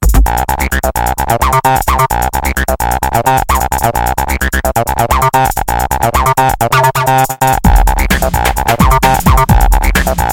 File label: Acid Techno